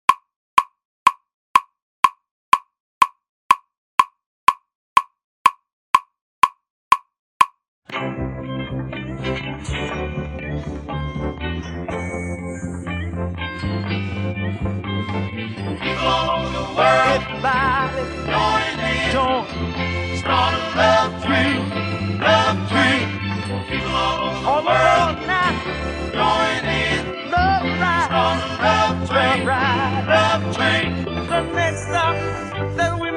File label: Drumless